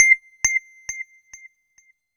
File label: C5, SynthLine; 90 s Sample CDs; NiceBeats; SynthLine VOL-10